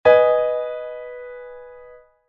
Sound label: G♯m, Chord Inversions